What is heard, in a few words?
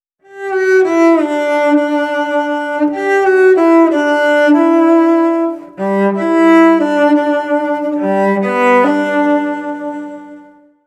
cellist; wedding